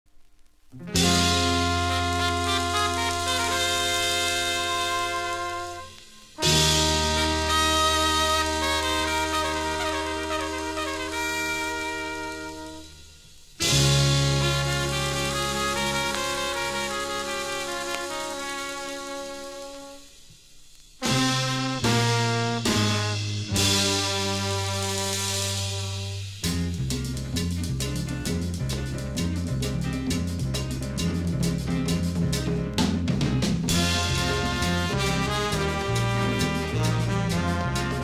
National Broadcasting Grooves; Algeria to Malaysia

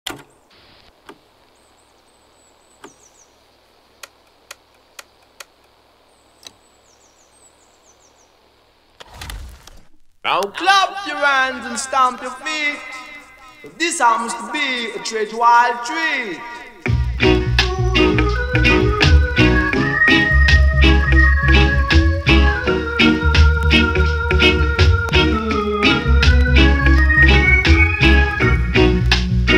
Reggae & Deep Dub

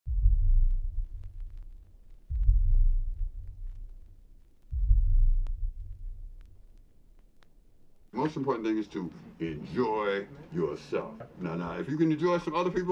Deep & Soulful Jazzy House; A Selection of Deep & Soulful Jazzy House

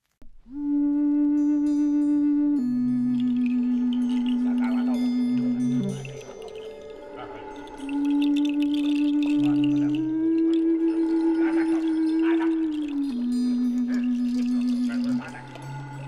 Brazilian Reggae Grooves; A Playlist of Brazilian Reggae Grooves

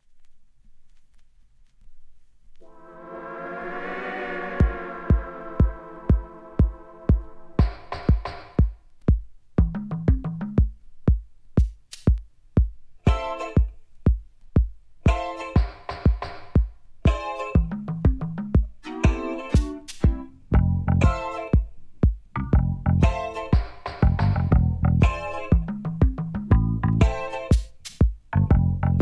Japanese Reggae Pop from the '70s & '80's

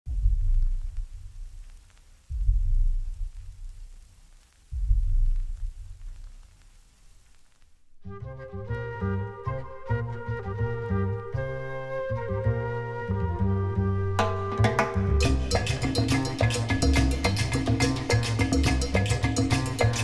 Latin Jazz & Jazz Dance; A Celebration of Latin Jazz & Jazz Dance

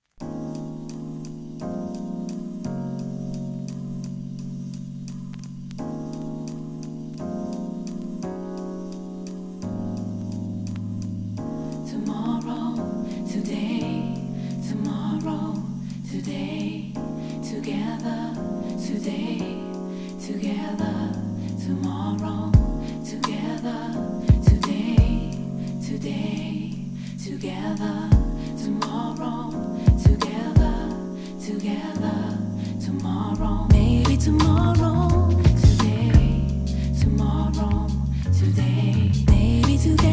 90s & 2000s Nu Jazz; A Journey into 90s & 2000s Nu Jazz

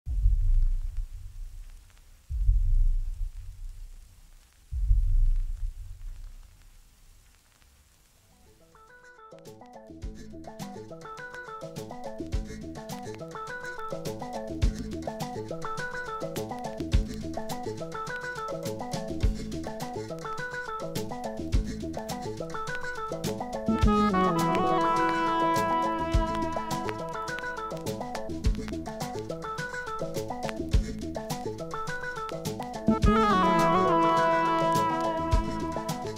Jazz Funk & Fusion Vinyl; 70s- 80s

